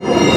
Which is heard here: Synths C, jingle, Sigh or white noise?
white noise